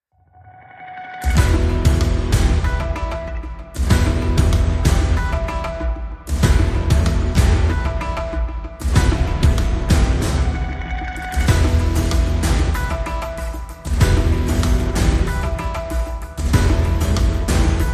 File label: No Lead Strings